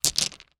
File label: stones pebbles few place down; foley; zapsplat